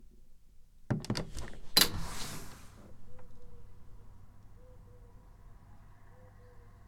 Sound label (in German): Seeblick, Chicken; Knife; Holthausen/Düsseldorf; Christoph Collenberg